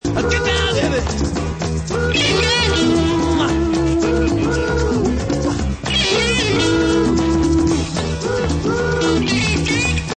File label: guitar